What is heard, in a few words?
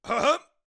Teacher Attack Vocals